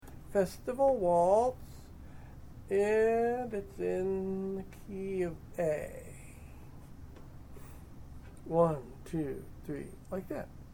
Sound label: Festival Waltz, Fiddle; Fiddle chords